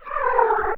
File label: CAT, Anime Sound FX; COMIC